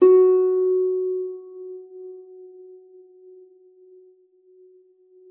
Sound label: F4, harp forte; Xsample VOL-8